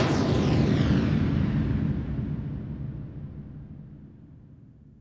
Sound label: electric explosion; Crash Bang Boom